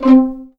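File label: C4 pizzicato forte left, violins; East West; The Ultimate String Collection; VLNS PIZZ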